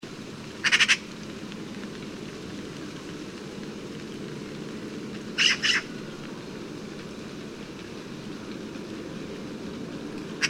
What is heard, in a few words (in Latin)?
Pica pica; SETE